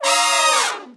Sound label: X P Level Up Horns; subway surfers city sound effects; Android, iOS